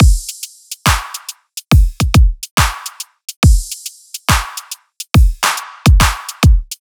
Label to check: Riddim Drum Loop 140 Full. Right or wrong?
Right